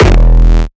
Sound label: kick oneshot monster E; GhostHack